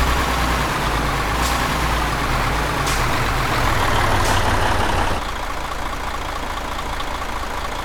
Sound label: slowdown, vehicles truck; truck actros; lwcars